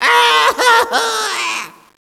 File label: cry, Echo inside caves, underground; DS; ML BOWSERS INSIDE; Luigi